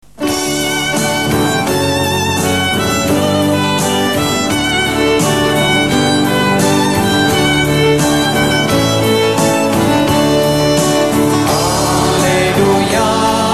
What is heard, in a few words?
aleluja; Alleluja 2; medjugorje